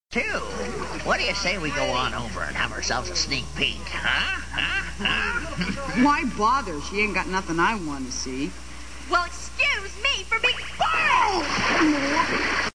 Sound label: pick